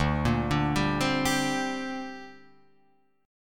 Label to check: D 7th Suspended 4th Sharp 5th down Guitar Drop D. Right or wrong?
Right